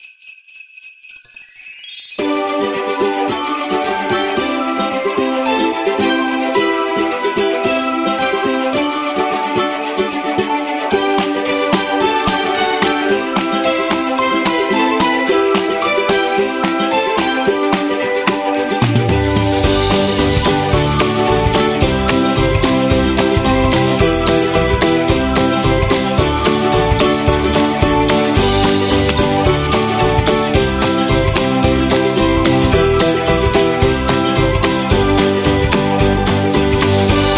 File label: Christmas Beat